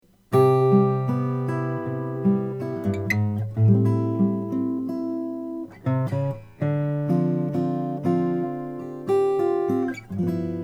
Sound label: Olson SJ bubinga; waterfall